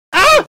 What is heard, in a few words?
yelped